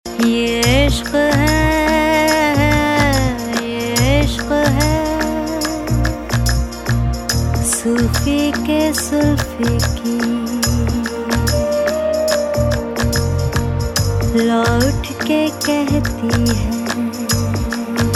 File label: Female Ringtone; Bollywood; Rangoon